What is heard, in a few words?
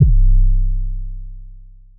808 Hit; Kryptic Samples